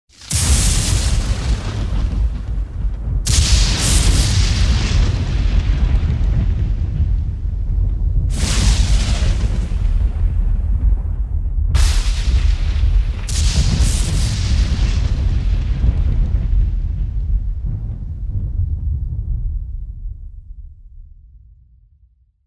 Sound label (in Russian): neskolko molnij podrjad